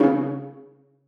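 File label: violas pizzicato c3; Sonatina Symphonic Orchestra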